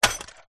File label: science fiction robot android metal single footstep